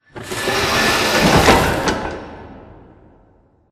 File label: Prop Rack Curve; Prop Warehouse; Titanfall 2; Boomtown